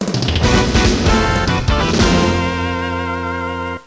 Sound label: Game Over Music; breakers collection; ps4 ps5 switch windows xbox one xbox series xs